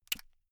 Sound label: buckle plastic open